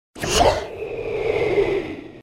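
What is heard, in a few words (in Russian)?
чих и ветер; Звук ветра; Звук ветра для перехода в видео или для монтажного перехода в аудио монтаже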